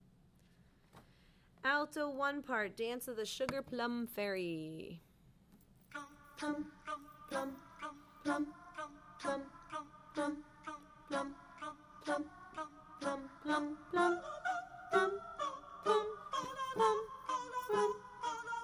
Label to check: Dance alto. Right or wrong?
Right